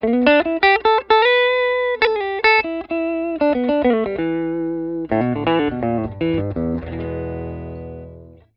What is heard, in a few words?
LP NECK LICK, humbucker pickup; patent denied humbucker pickup